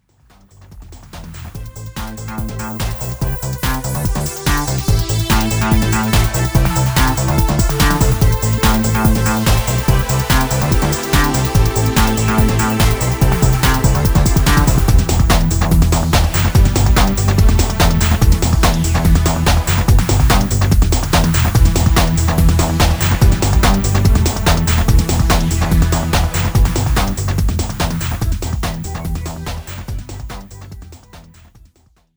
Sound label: Techno Sports